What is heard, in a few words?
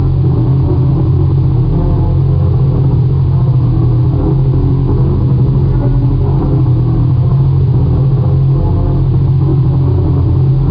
Wind Metal Low